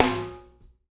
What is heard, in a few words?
SNR